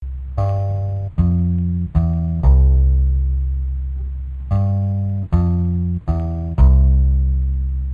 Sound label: C Major Bass